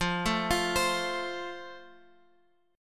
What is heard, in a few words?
F Major, Guitar Open F; F arpeggiated